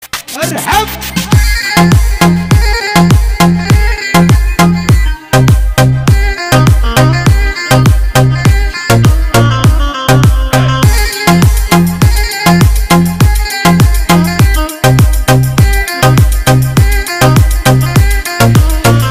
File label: Dabkat Ringtone